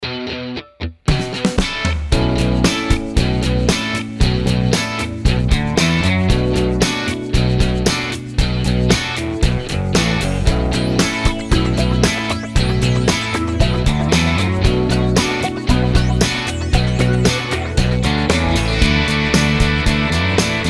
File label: pick mix